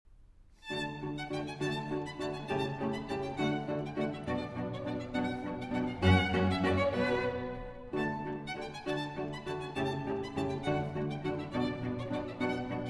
Quartet in D Major II. Minuetto Alla Valtz. Allegretto Mosso